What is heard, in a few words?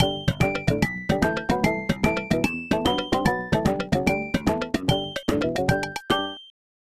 Congratulations!; SimAnt; SNES